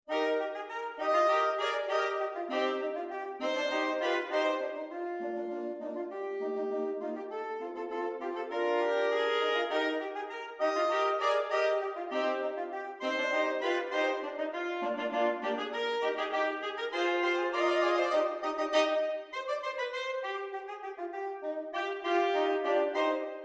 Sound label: Fanfare Intrada